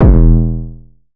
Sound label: 808 oneshot Bonk E; Slate Digital BASSHOLE Sample Pack